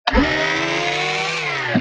Robotic Motor; SoundsCrate

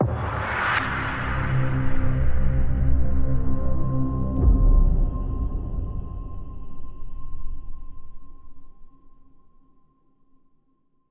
Suspenseful Ident; soundscrate

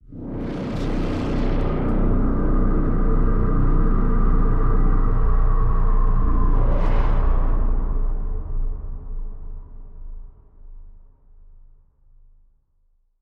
scary swell

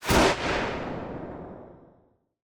Lightning Crack; soundscrate

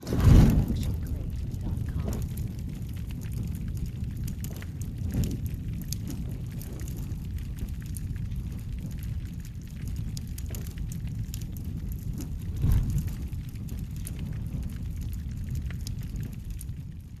Large Fire Lighting; SoundsCrate

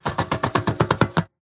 train going; soundscrate

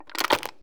soda can crush; soundscrate

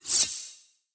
anime sword swipe down

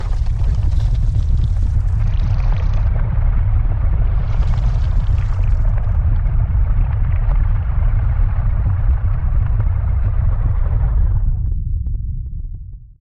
earthquake rumble various cracks snaps; SoundsCrate

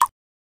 bubble pop

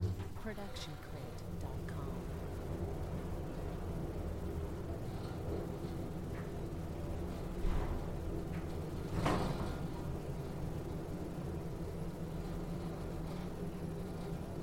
elevator moving multi floor; soundscrate

